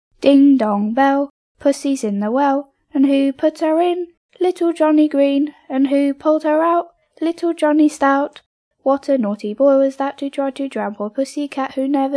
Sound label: Ding Dong Bell; Pussy's in the well; Nursery rhyme (traditional); English; Tongue twister